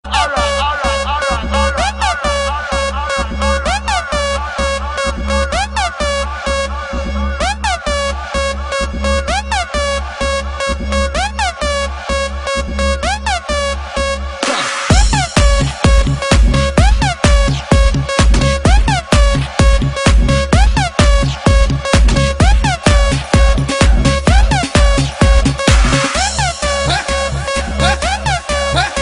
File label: Electro Nite